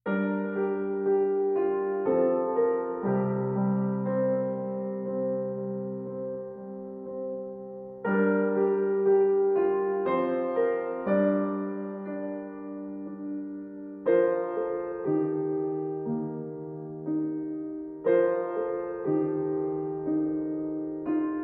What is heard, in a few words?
Piano Only